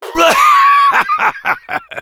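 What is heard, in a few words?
laugh happy, French; (Rire joyeux 5); Réponses du Heavy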